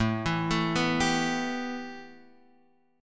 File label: A 7th Suspended 2nd, A7sus2 chord; A7sus2 arpeggiated; Standard